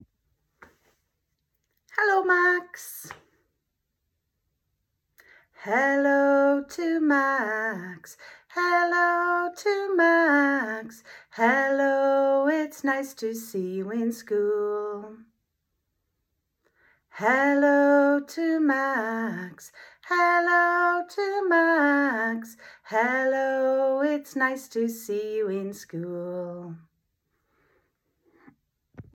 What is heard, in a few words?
morning song